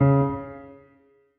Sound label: marimba; ゆめ2っき